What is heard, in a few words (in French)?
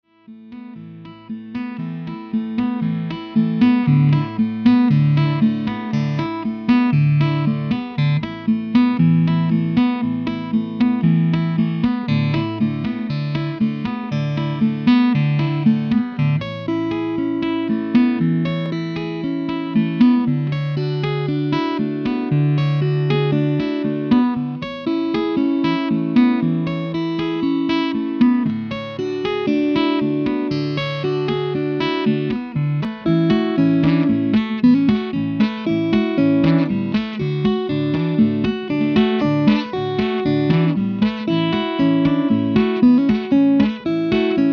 guitare instrumental